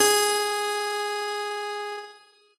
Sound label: piano; pianojs